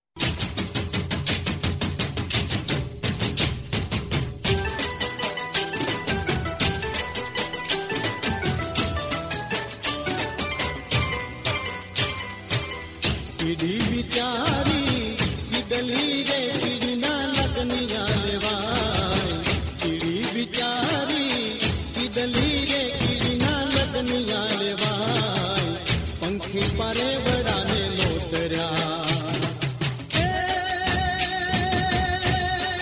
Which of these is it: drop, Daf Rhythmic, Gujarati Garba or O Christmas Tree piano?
Gujarati Garba